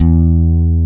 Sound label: E1 TELECASTR